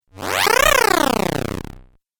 Cool Fx Video Game

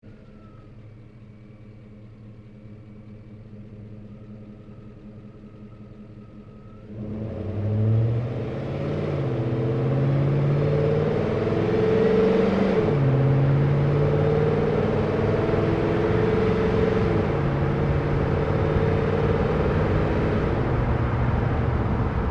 Truck GMC Savana Onboard Drive Corners Fast; 2010 GMC Savana; Trucks; Big Room Sound

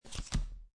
Playing Cards Deal Single Card Turn Or River; Card Sound Effects

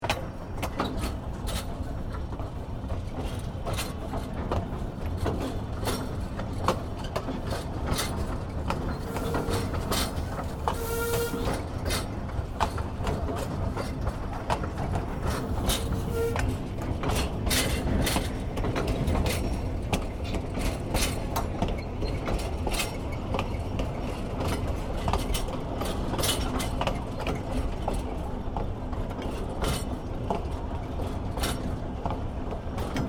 AMBIENCE TRAIN CARGO TRUCKS LOOP; Train Stations; Cargo trucks from Bellerine tourist railroad

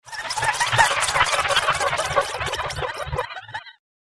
Gathering Magic, magic sound effect; Magic Sound Effects; fantasy sound effect; Andrew Fleming

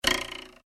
comedy ruler twang; Comedy / Cartoon Ruler Twang; Funny Sounds

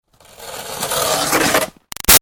Snow Scrape Shovel Up Gritty; Yard Tools; Big Room Sound

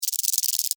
Beetle, Shake, Wings, Insect, Bug

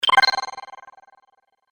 Magic Purchase Vibrate Ding; Cartoon Sound Design Sound Effects

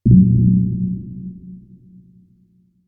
Space Style Ambient Noise, Eerie; Sci-Fi; Stuart Duffield

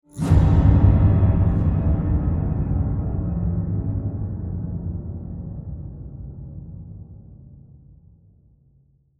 Short Horror String Transition; Scary Tones

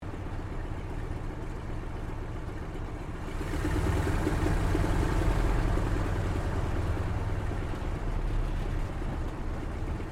AIRCRAFT CAC WIRRAWAY TAXI INTERIOR; CA-16 Wirraway; Airplanes; Vehicles; Aircraft Collection; Twistedtracks

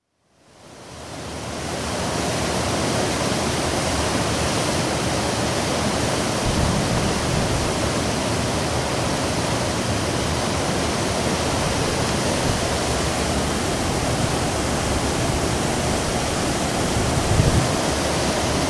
Car Ferry, seawash at stern; Ocean; Car Ferry St Nicholas, 17,400 tons, built 1980; BBC

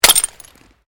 GLASS SHARD SMASH

